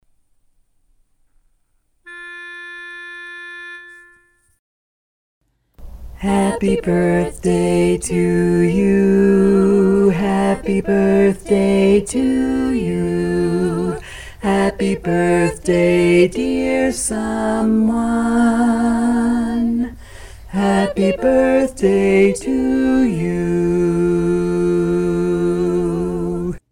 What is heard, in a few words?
Happy Birthday Bass